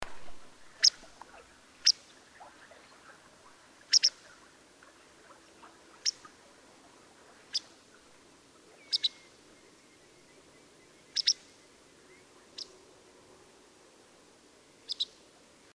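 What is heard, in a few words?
masked wagtail, kyzyltan sea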